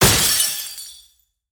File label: destruction glass break smash; شکستن شیشهی بزرگ; transitions and movement